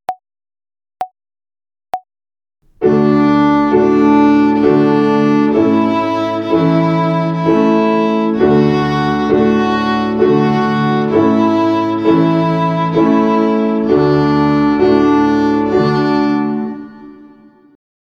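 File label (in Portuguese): Ginástica até o dedo 2 - Corda RÉ - Ternária, Violino; Violino para os Pequeninos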